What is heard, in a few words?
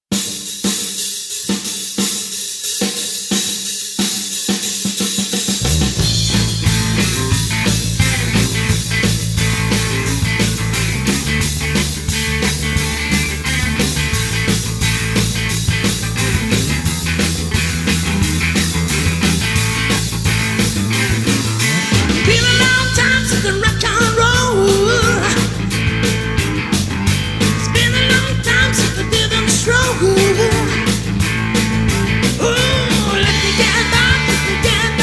rocknroll